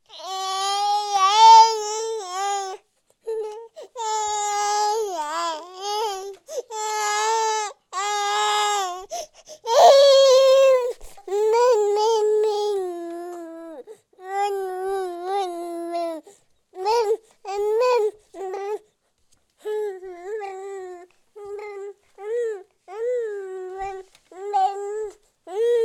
Baby Infant Whining Crying; 2496SoundEffects; Oh Baby! Pack 2 Baby Sounds

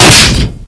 vent open, metal; hl2; impact

